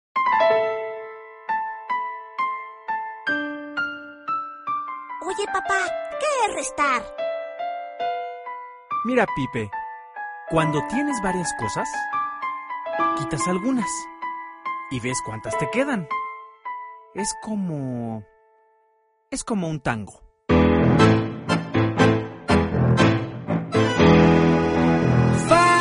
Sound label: pipe